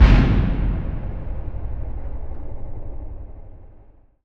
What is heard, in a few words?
Impact Boom Metallic; bottlerocket